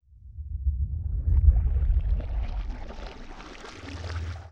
Liquid Transformation, Horror; Sound Design

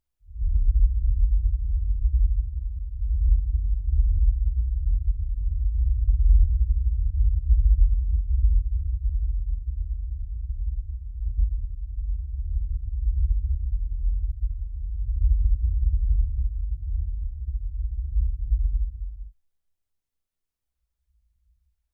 Explosion, SUB; 5.1 Surround

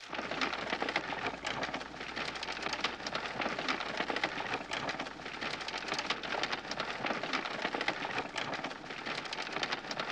Carriage Constant, Period Vehicles

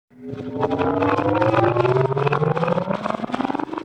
Comedic Rising Whirl; Vintage Cartoon

